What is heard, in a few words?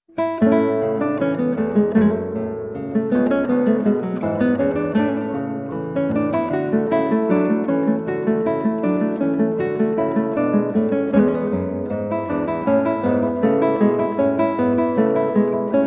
Allemande in A Major, Guitar